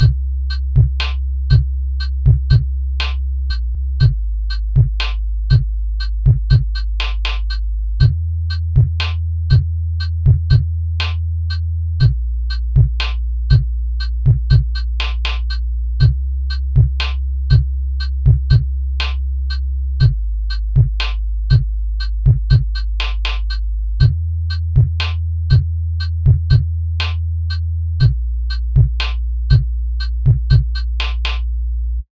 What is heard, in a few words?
Sinewave Project